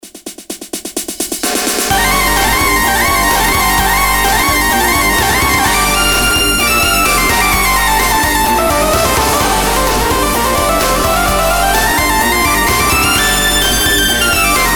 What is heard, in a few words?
lead